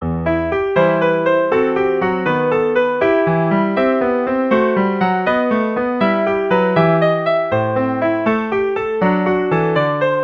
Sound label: test composition piano